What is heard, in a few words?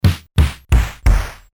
linn snare, 8 bit sample playback; recording from my LinnDrum Snare